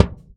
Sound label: Punch, Kicks; Airwindows